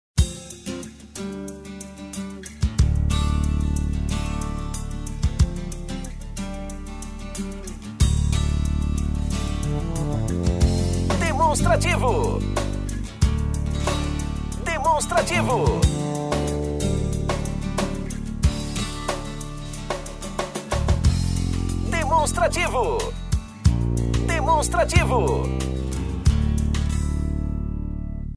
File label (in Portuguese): tema espera